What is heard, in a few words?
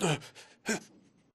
crawl diag grunt; Titanfall 2